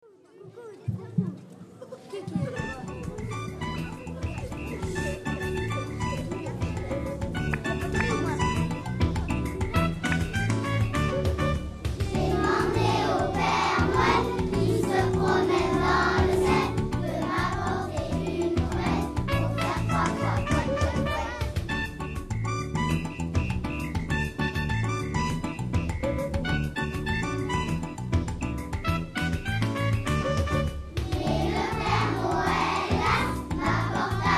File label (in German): Trompete